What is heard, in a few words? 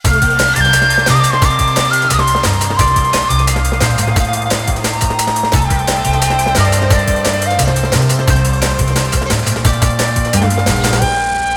japanese ninja drum and bass logo